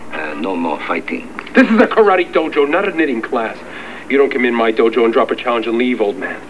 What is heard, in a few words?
KARATE CLASS